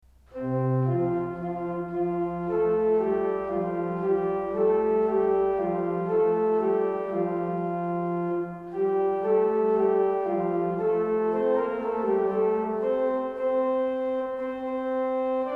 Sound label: all hail fanfare